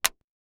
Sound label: Sports Hockey Puck Hit With Hockey Stick